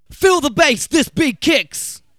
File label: VOX SUNG; Megabass Remix! 2